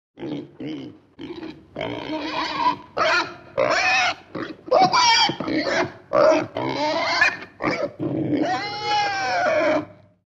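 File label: svinya golos, pig voice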